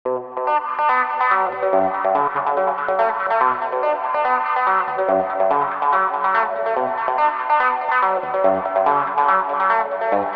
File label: Guitar Wah Maker Wet; filters bundle